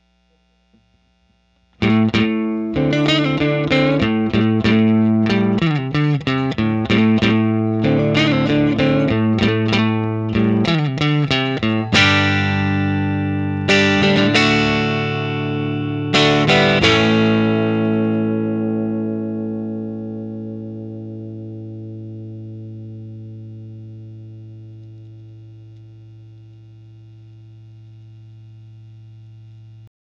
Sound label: 6v6 clean